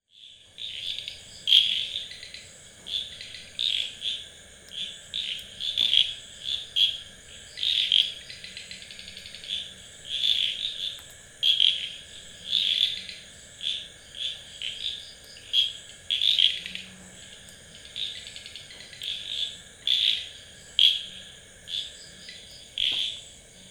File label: CRICKETS